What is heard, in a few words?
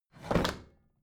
bathroom drawer open heavy